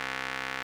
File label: RMI EP; Sonic Reality - Vintage Timetraveler